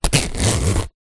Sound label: wetsuit zipper; foley